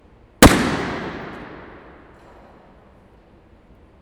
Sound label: Balloon Pop